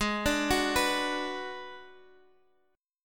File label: Ab Minor 7th Flat 5th Ab, down, 4G, 5C, 5E, 5A